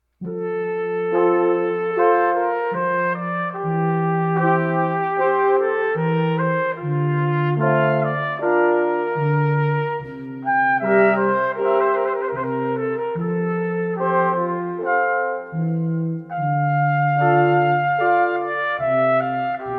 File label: Brass Quintet Arioso; Johann Sebastian Bach